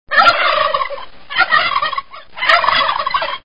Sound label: Turkey Gobble